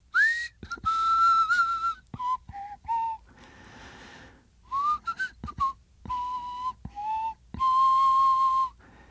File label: male whistle